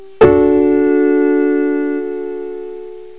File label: piano, notes; ClickArt 300,000